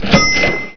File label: Cha-Ching; warprust